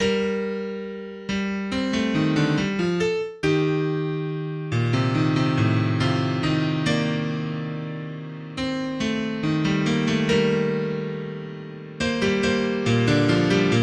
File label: Early Rise for Piano